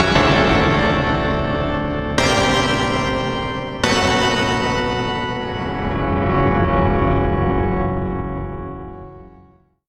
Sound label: chords